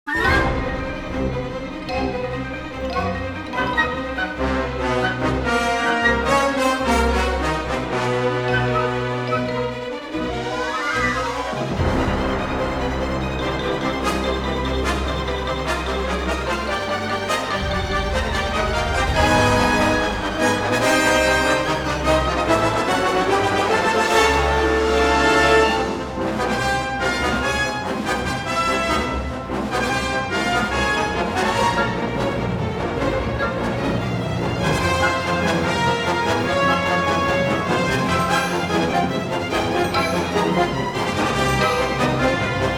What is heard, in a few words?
Chinese Action; Indiana Jones and the Emperor's Tomb; Xbox